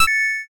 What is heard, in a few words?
judge perfect